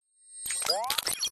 nanotech complex short reversed robotic robot cyber tech nano micro reversed; PMSFX